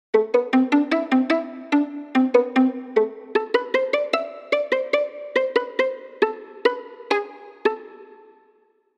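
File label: VNO SOLO pizz, violin pizzicato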